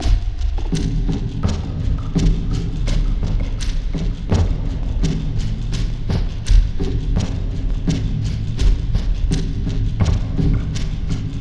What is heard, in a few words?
SLOW PROCESSED GROOVE; Sonic Foundry (Sony Creative Software); Vir Unis Infusion